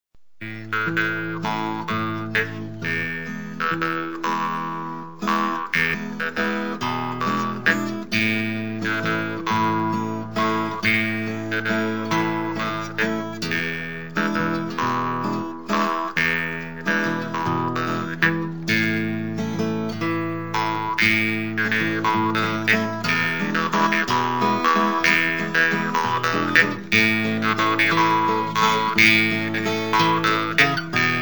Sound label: Strum und Twang, jew's harp